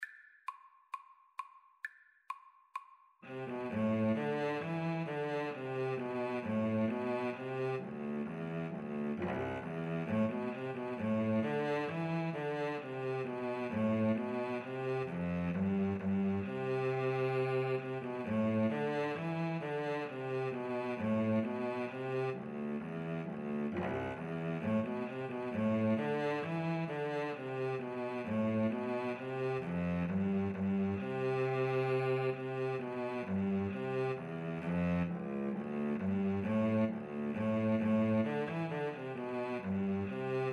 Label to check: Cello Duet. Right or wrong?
Right